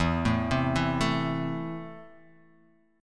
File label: E Suspended 4th Sharp 5th